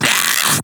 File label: Infested Splitter Split Top Vocal Pain; Warframe; Grey Strain